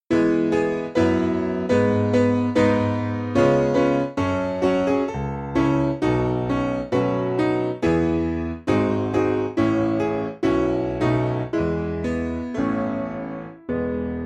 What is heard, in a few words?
Spiritual Piano; 3/E; Let Us Break Bread